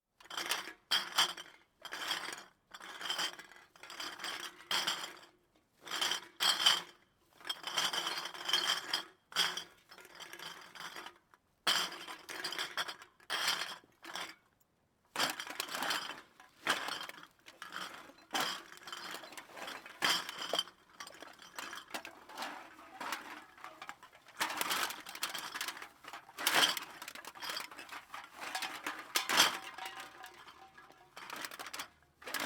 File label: bottles empty far, household